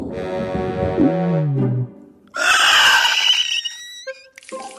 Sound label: angry ailen gasp